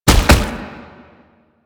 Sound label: Gallop; Enemies; Steps; Proto Infested Brachiolyst; Warframe